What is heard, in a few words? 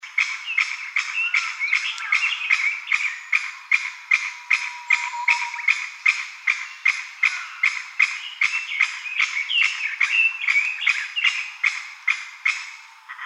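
kojukei C, Chinese bamboo partridge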